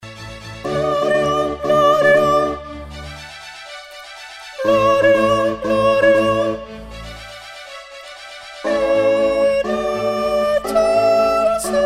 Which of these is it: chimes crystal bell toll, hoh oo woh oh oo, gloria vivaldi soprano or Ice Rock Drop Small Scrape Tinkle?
gloria vivaldi soprano